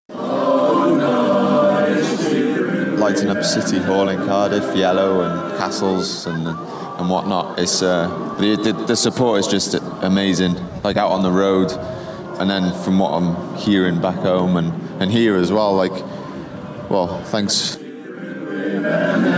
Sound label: welsh choir